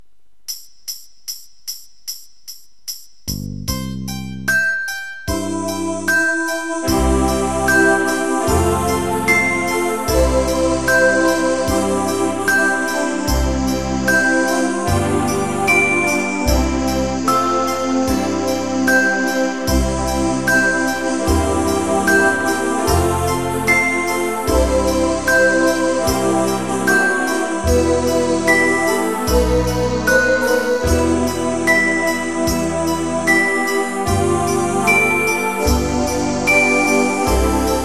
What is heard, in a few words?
minuet, orgel